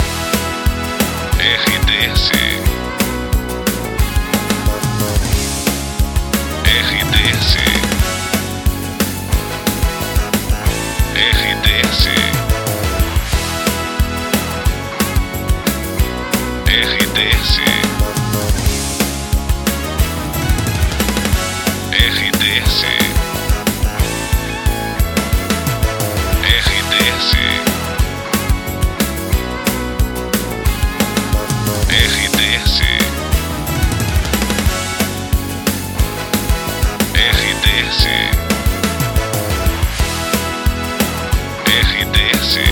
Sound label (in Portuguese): TRILHA LOCUCAO; Expresso